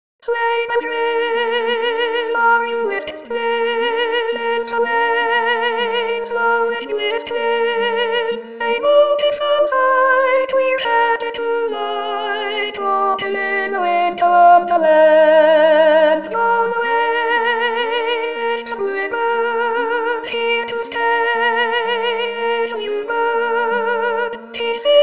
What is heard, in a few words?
Soprano